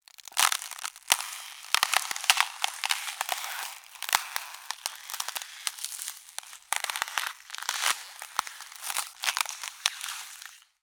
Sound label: nature ice crack